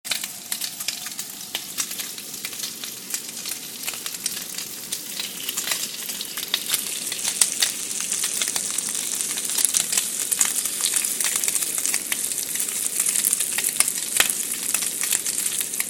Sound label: the sound of bacon in the morning